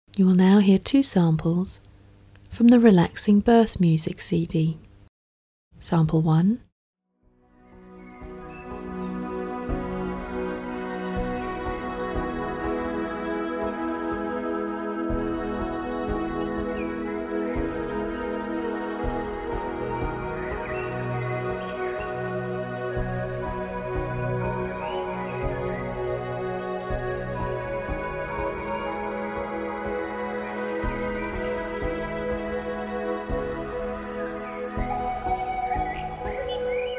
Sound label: Relaxing Birth Music